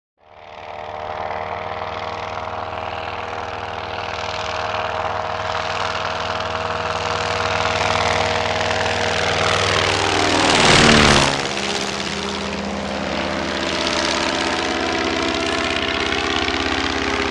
Prop Plane; Take Off; Old Prop Aircraft Take Off Close Overhead